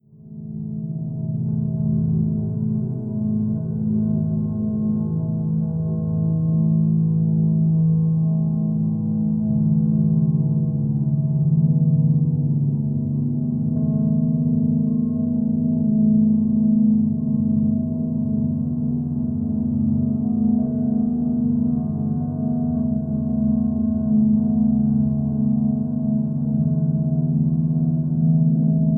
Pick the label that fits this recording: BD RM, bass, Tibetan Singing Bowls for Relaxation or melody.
Tibetan Singing Bowls for Relaxation